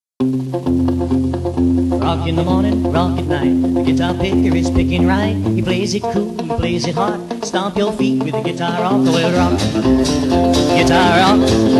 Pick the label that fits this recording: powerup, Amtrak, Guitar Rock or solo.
Guitar Rock